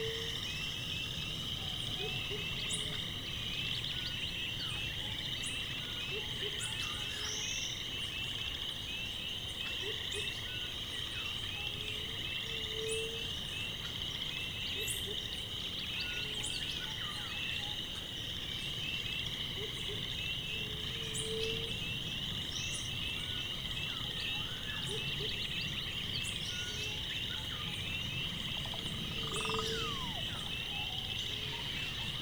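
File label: ambience spoons island lagoon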